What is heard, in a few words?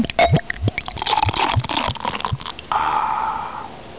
beer